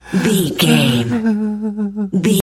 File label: voice male b cry whimper high